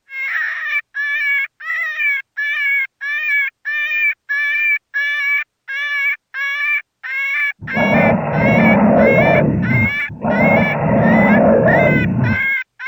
Cottontail And Black Bear; Rabbit

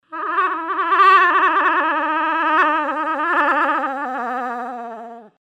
Cow In Heat Long, Moose

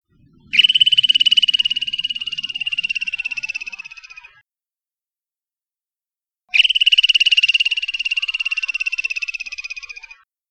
Squirrel Chattering Antelope; ORION

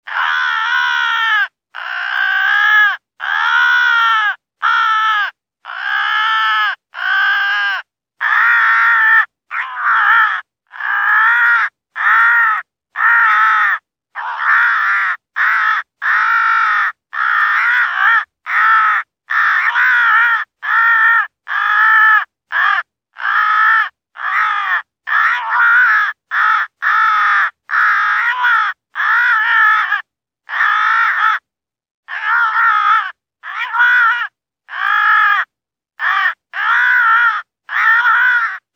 Crow distress

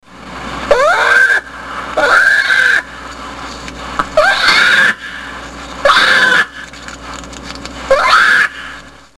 Bear black cub distress

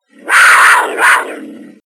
Panther; Leopard; ORION

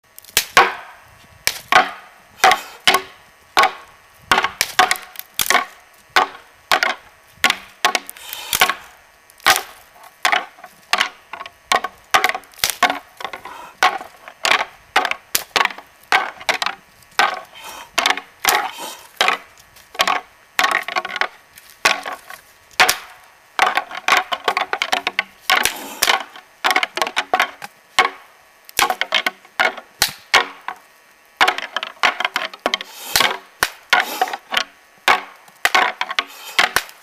Bulls Fighting, Moose; Dave Kelso

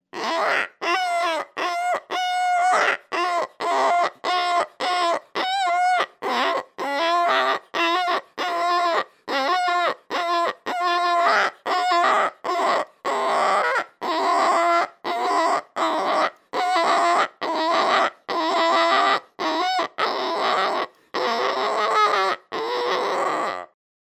Single hog distress; Dave Kelso

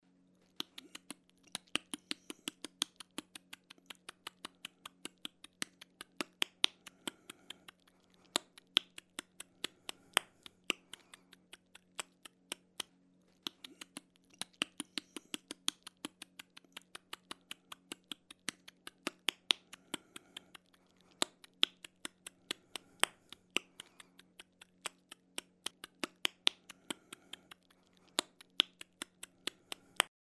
Buck Clicking Teeth, Deer; Dave Kelso

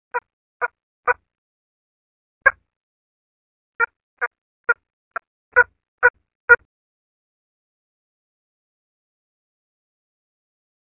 Hen and Jake, Turkey

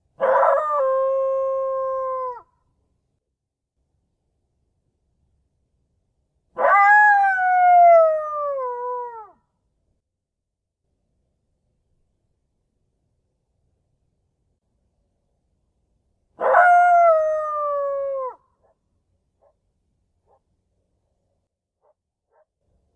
Coyote Alerts

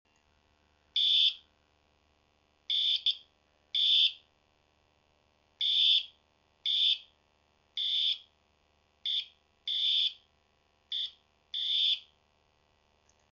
Pintail Whistle, Duck

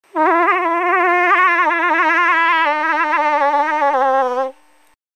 Young Cow In Heat Long Call, Moose

